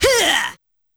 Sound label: Huuaah!